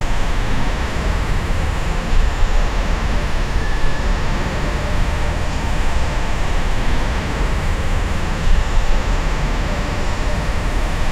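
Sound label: future industrial, industry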